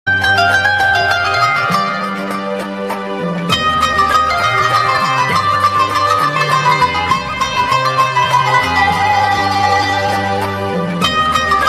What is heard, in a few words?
Instrumental